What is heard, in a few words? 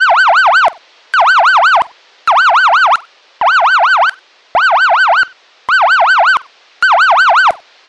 warning alert siren